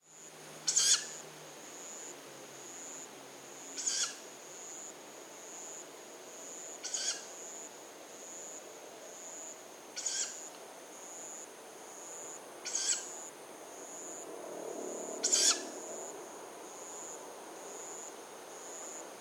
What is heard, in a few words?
owl young